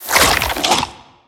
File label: cage open ribs